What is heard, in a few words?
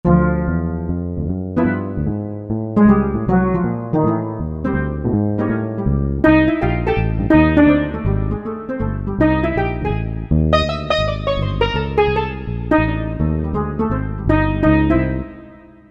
Steel Bop